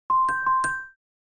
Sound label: Simple message notification